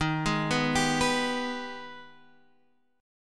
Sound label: Ab Minor Major 7th